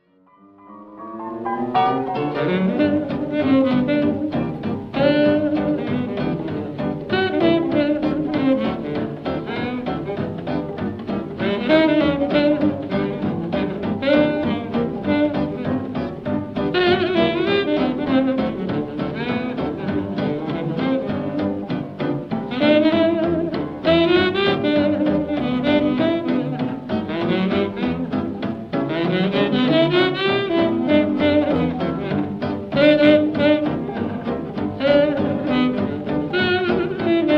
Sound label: melody